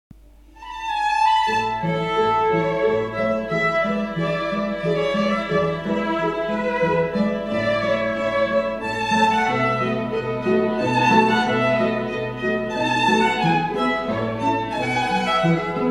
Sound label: Minuet, string quartet; Boccherini